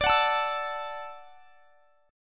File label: D-Major Add 9th D down; Dadd9; strummed